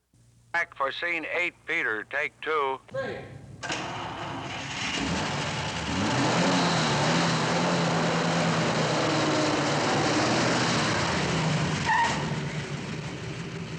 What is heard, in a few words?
Large Old Car Starts And Stops With Brake Squeaks And Gears